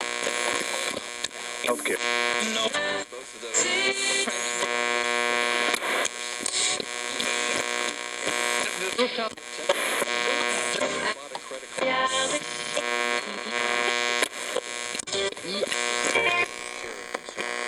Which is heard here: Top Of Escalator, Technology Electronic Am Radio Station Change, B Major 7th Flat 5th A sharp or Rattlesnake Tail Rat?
Technology Electronic Am Radio Station Change